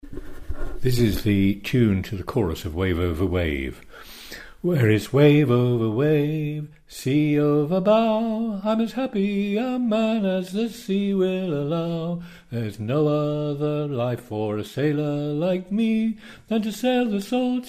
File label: Tune